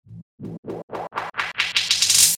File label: zzzzap wind up